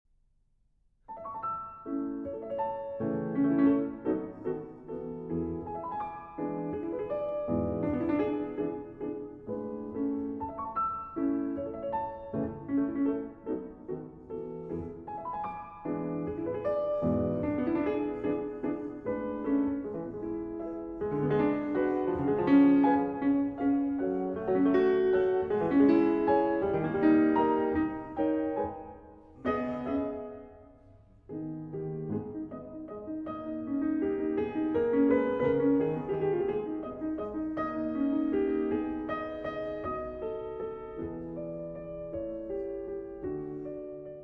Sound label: Piano Sonata No. 2 in A Major, Scherzo (Allegretto); Beethoven; Op. 2 No. 2